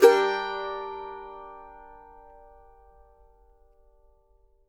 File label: mando min; Sampleheads; Whole Lotta Country 1 & 2